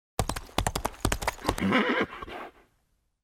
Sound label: Horse; cinematics; Age of Empires III - Complete Collection; Microsoft Studios